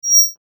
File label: CHIME SWELL, Chime Swell Bright High Pure; Chime Swell Bright High Pure Production Element Imaging Element Accent Transition; Multimedia; Blastwave